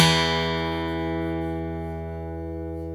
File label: 12 STR E2, PIANO; AKAI S-Series CD-ROM Sound Library VOL-8